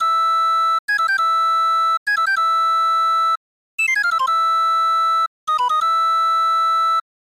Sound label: Great Eagle Flute; lennus 2; snes